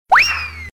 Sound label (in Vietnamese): Am thanh nhay len bien mat va bay di hoat hinh